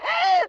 erzsebet damage

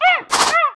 deadween attack

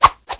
attack bow

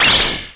attack; YORTUS A

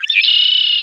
hill wind attack

